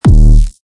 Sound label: swap